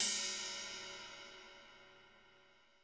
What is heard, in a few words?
RIDE BL; Crypton - DrumBase5000; R70 KIT #1